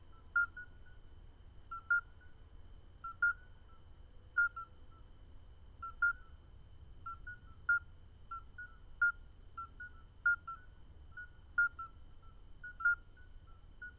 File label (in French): Alytes obstetricans choeur